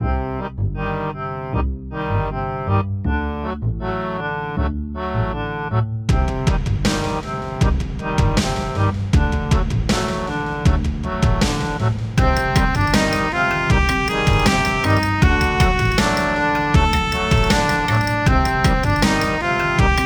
beach boss; Adventures in Lestoria; action RPG game; Sig Productions

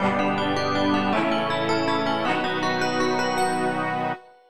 fanfare

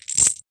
item sell; Adventures in Lestoria

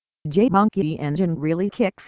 kick; jmonkeyengine

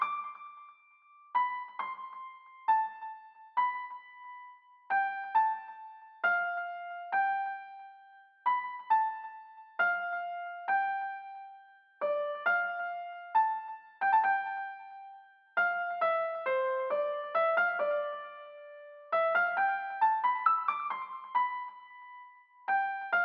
piano; Adventures in Lestoria; piano 1; action RPG game; Sig Productions

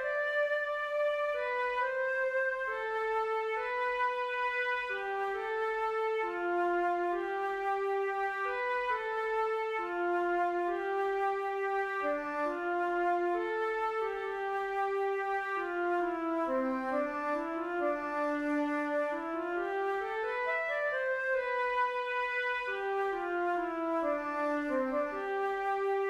flute, music; action RPG game

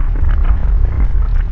meteor lingering; wizard; action RPG game; Adventures in Lestoria; Sig Productions

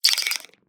wizard lightningbolt; Adventures in Lestoria; action RPG game; Sig Productions

